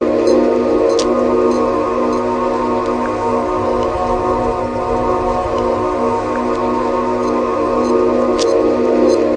bellwater ambience; Pads

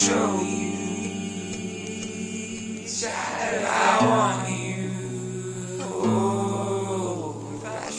120 folksy vocals; remixable collections; Folksy Guitar and Voice Stems

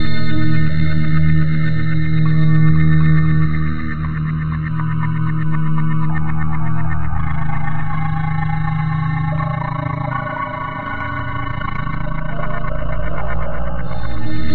132 dream stutterpad; SYNTH AND ELECTRONIC LOOPS

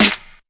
clap, drums single hits; Claps

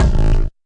fx drum, Electro FX

